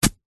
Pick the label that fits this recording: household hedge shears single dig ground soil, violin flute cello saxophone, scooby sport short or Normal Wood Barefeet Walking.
household hedge shears single dig ground soil